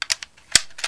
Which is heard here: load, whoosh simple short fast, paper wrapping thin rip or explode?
load